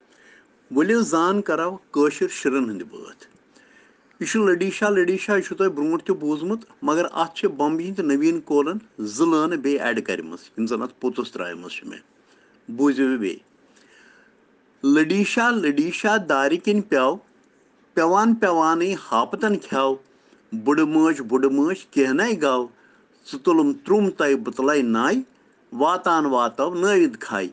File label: Children Rhyms in Kashmiri